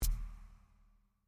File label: taiko groove tag; JSO elements